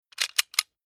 Weapon Pistol Hand Gun Cocked Old Austrian Steyr 1914